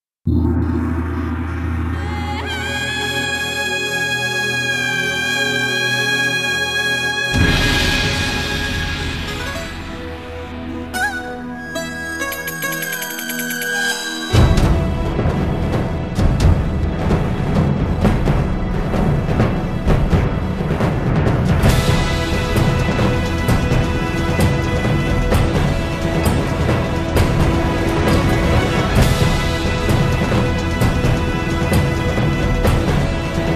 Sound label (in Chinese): 电视包装音乐